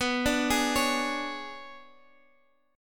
B-Minor 6th Add 9th B down Guitar Half Step Down

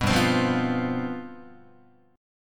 G 11th, Guitar; G11 strummed

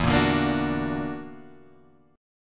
Gb Augmented 7th E

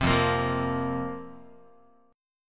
F Suspended 4th Guitar, chord; Fsus4 strummed; 3,1,1,3,2,x; JGuitar

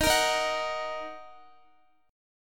D sharp Minor, Guitar; strummed

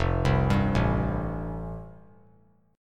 F Major Add 9th; Fadd9 arpeggiated; JGuitar

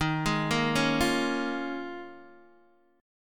Eb 9th Suspended 4th Eb down Guitar DADGAD; arpeggiated

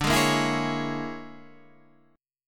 A sharp 7th Sharp 9th Flat 5th D

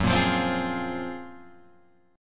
Gb Major Sharp 11th, Guitar Open G

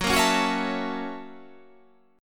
F sharp 7th Sharp 9th

